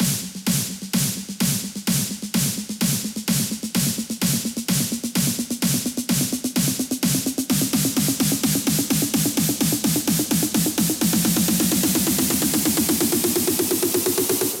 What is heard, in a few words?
Build Up Drums 128 BPM